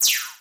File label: laser beam